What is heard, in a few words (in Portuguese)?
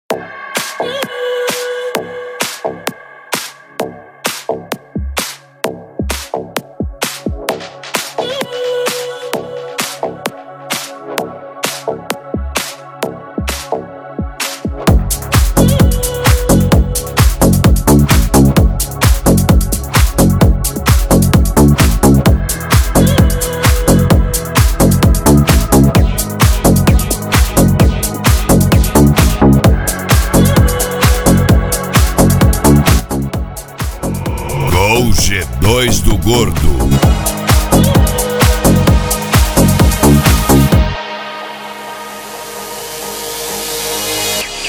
especial retro; gol g2 do gordo